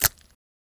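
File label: sounds of lettuce leaves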